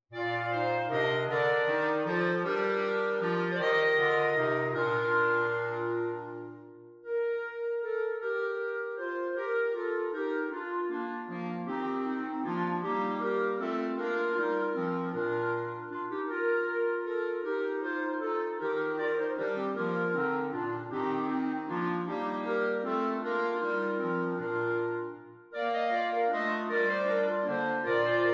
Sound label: Clarinet